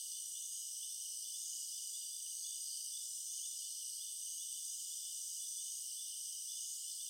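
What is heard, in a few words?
jungle loop; nature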